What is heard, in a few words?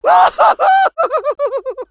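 laugh happy, French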